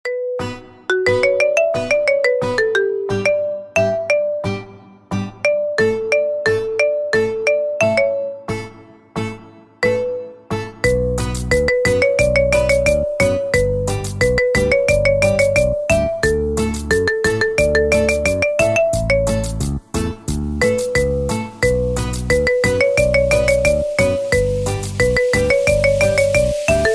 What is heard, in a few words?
marimba ringtone